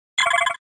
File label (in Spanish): Grito de Beautifly